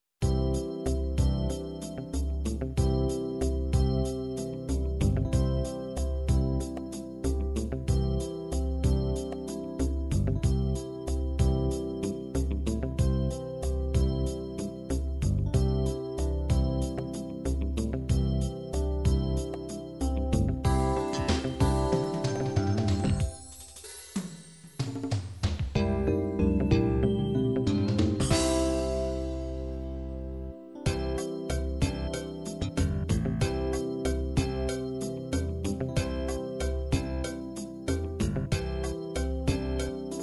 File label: latin bolero, midi